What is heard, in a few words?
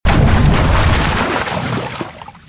water; StarEscort; qiyu